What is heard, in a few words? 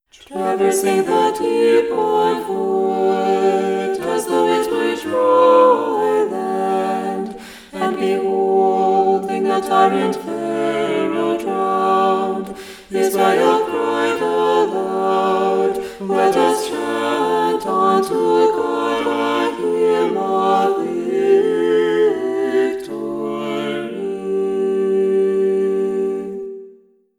Irmos; Choir